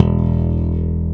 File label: WAL BASS